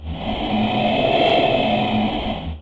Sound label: snarl, monster